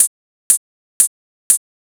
NRG Cymbal

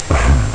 hmm; ST-XX Amiga Soundtracker Sample Packs

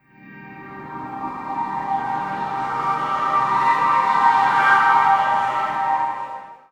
Fall, Hits & Falls; Zero-G Trance Inducer

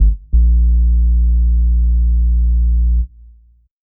BASS, Hip-Hop & R&B; USB Soundscan vol.47; AKAI

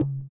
THROAT; Spectrasonics; Metamorphosis; THROATERS

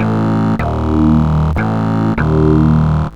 Bass, Synth Bass Loops

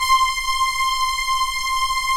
LUSH STRING; Pro-Rec - Synthsonic; Z1 PADS

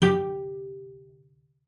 CELLO FINGER G4; 90 s Sample CDs; Xsample VOL-5

